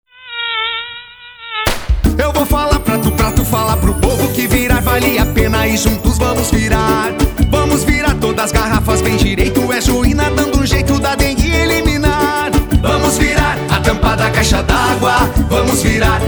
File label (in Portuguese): Jingle Dengue; Juina